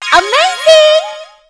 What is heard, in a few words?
COMBO AMAZING